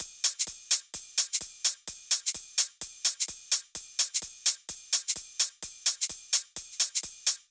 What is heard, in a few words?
128BPM Kit; Praetor Kit